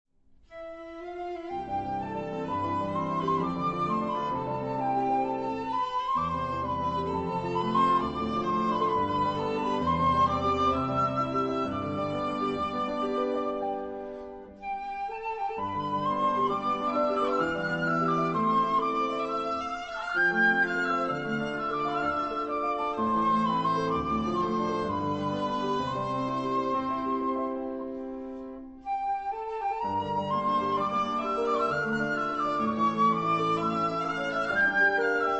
FRENCH FLUTE

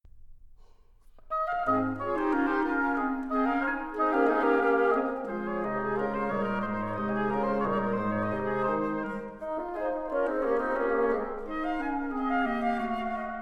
TIMPANI

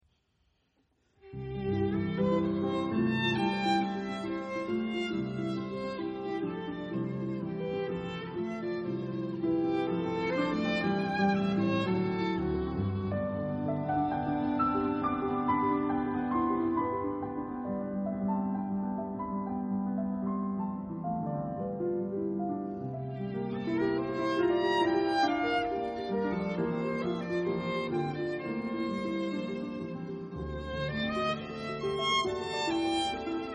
Sonate violon piano

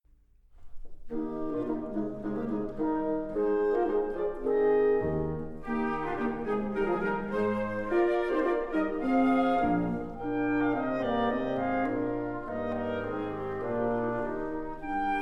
Courante TIMPANI; orchestre

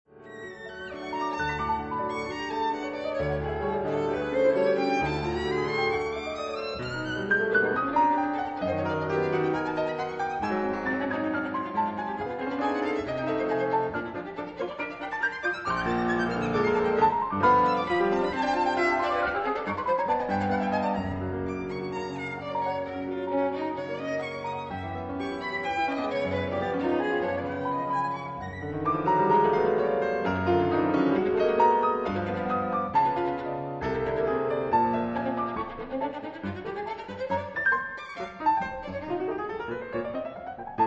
Sonate violon piano; Sonate, violon et piano (Paris : Heugel, 1927); 2. Véloce

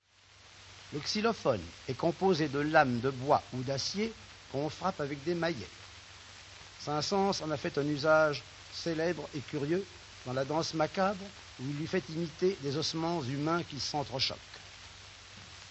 orch xylophone

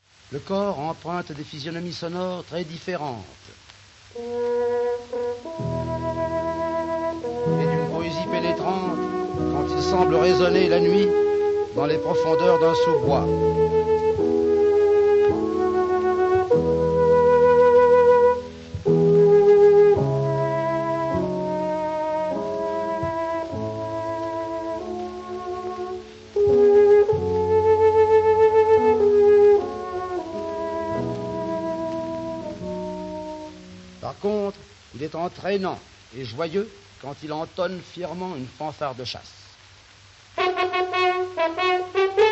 orch cor

